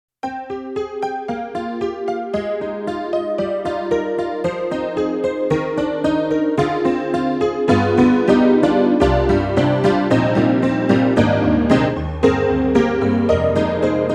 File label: YAMAHA MU90 Honky-tonk Piano KSP